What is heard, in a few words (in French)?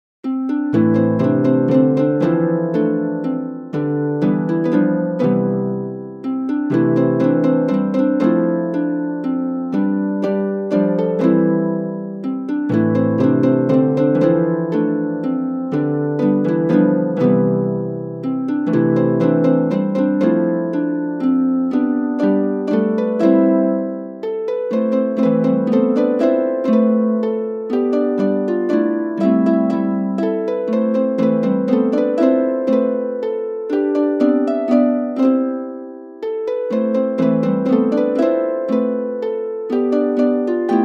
valse harpe guitares